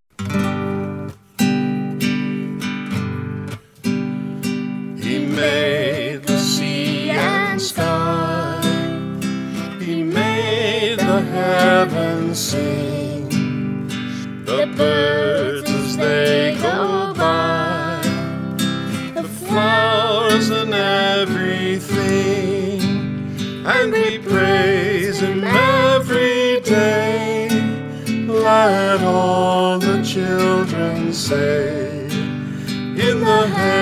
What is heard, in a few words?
guitar